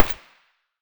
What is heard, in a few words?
scar silencer mono distant